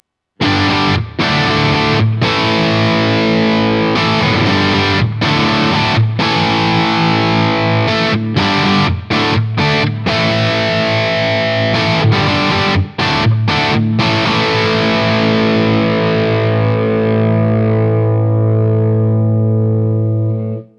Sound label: strat dist